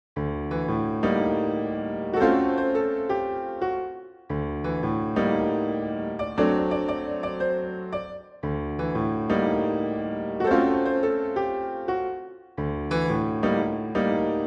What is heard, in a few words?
miniature in jazz, piano; pieces pour piano